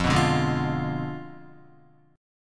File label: F Diminished 7th F down Guitar; Fdim7 strummed; JGuitar